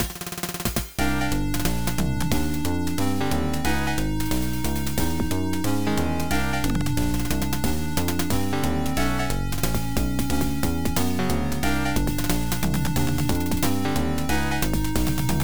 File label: Suspense; Kimi dake ni Ai o...; PC-98